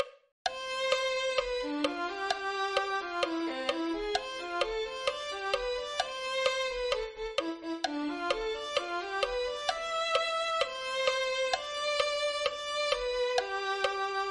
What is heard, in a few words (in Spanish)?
violin2